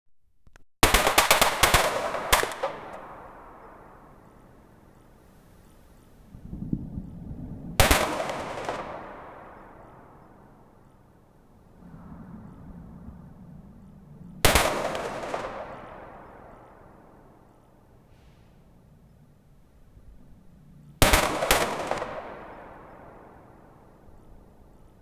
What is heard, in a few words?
the sounds of a shotgun, firearms